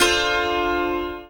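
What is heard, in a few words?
ZIMBAL PIZZI; Best Service; World Colours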